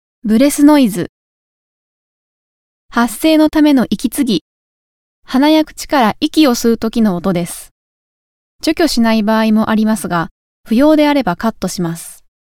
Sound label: Breath Noise Voice; ボイスクリーニング; ナレーション音声のノイズ処理